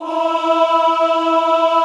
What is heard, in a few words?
OHH E3, Classical Choir; Best Service; Extended Classical Choir